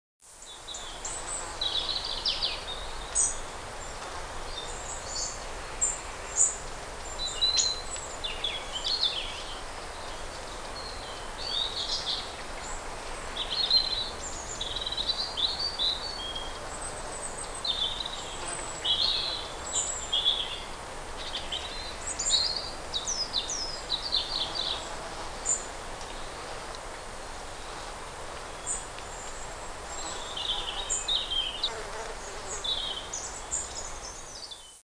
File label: hm atmos